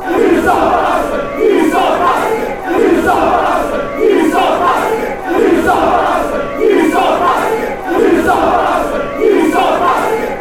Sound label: you sad b morecambe fanchants